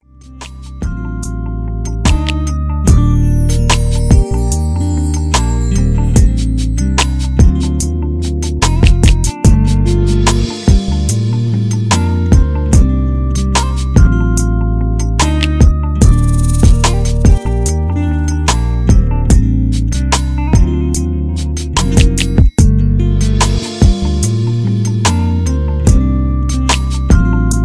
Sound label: ballad